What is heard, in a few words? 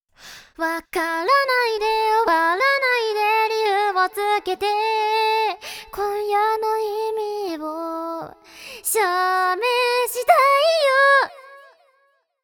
Delay Mod delay